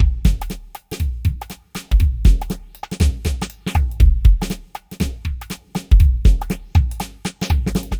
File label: jazzmatazz 120bpm, downbeat dance grooves